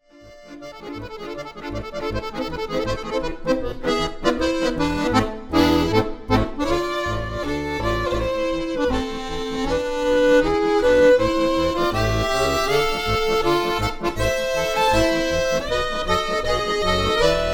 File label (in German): Polka